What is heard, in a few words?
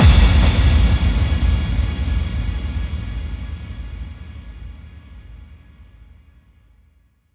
bassdrum reverb FX kick; Vengeance Samples